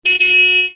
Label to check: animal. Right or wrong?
Right